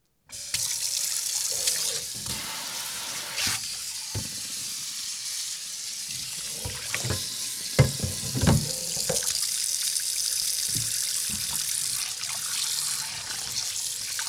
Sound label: Washing Dishes; Cooking